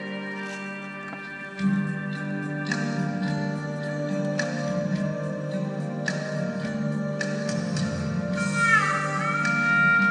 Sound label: bamboo moonlight chinese new year celebration; croydon